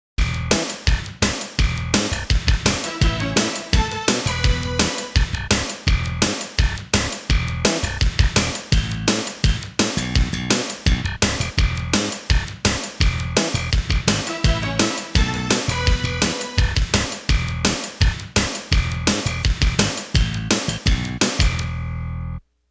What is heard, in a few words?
80s Groove